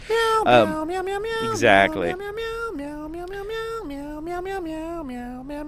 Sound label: sings; game of cats